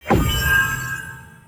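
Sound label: spell defense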